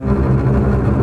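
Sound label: Bass Tremolo C#2; Multisamples; Digital Sound Factory Virtuoso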